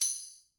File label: tambourine down; Idiophones; Struck Idiophones; Tambourine 3